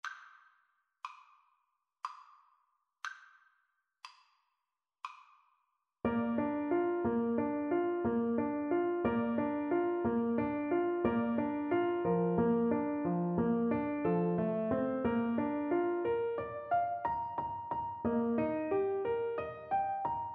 sonatina, Flute; clementi 1 op 36

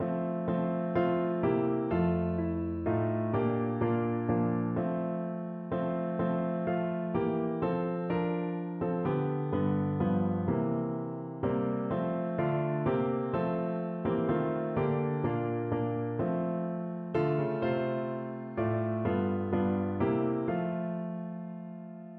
heaven christmas tree, organ

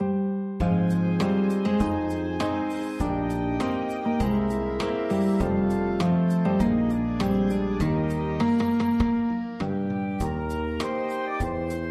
band, mixed ensemble

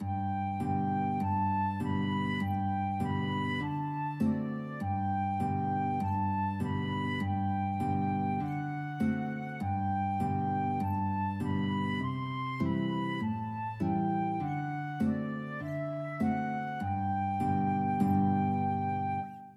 yankee doodle, flute guitar duet

